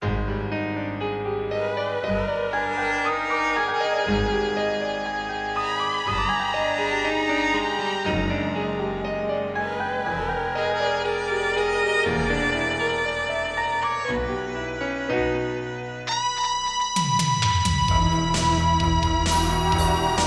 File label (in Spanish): musica de libro terror